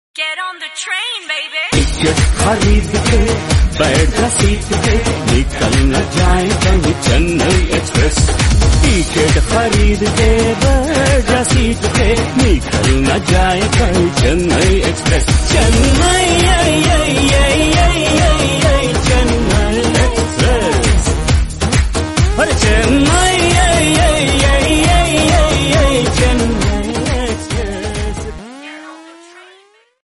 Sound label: bgm; chennai express